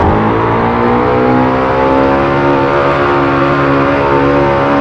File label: third, vehicles cars; lwcars